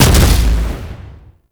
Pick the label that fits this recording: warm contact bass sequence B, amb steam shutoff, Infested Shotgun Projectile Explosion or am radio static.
Infested Shotgun Projectile Explosion